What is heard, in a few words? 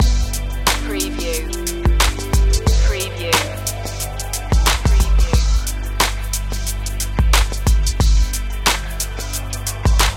breaking ground loop